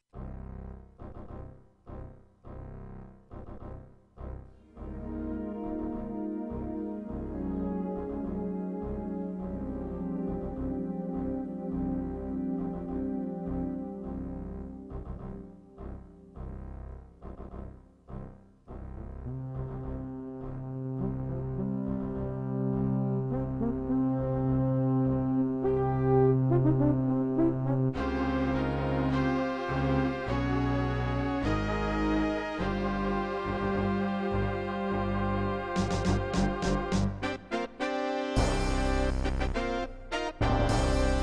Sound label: Music Opening MT-32; Centurion Defender of Rome